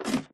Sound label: ladder, step; Minecraft